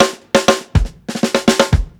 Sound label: 120 FILL, drums; LOOPS; Basement drums vol 2